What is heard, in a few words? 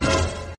vine shock